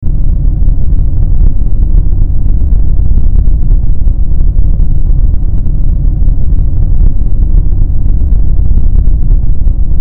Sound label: Into The Depths - Cave Ambience; Lego Bionicle: The Legend of Mata Nui